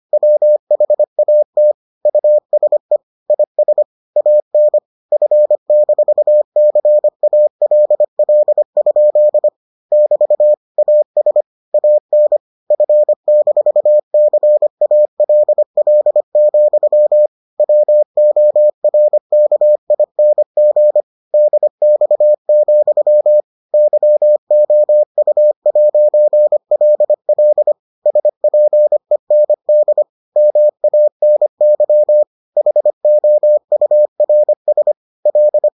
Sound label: morse